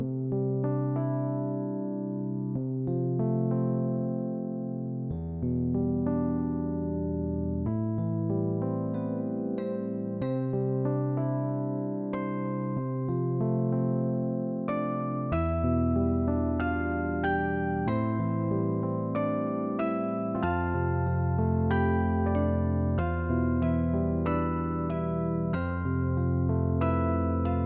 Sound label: MUSIC only